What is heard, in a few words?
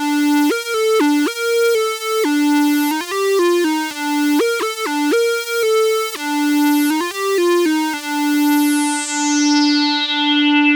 lead square; davesmith mopho